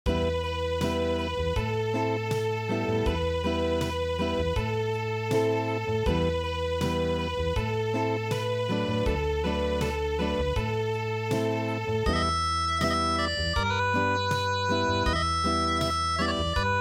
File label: Unplugged